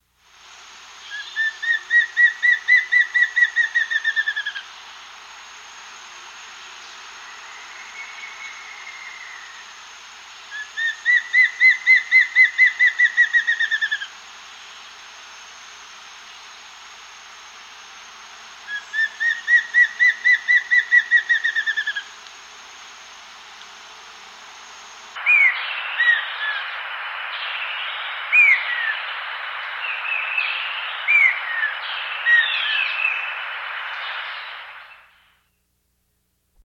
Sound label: Streak-Crowned Antvireo; S. America